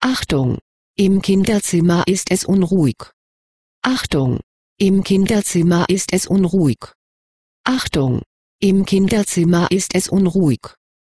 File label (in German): Kinder Wach